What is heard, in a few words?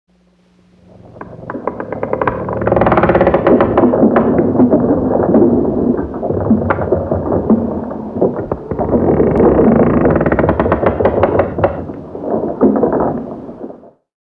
Dungeon Old Tree, Env Sound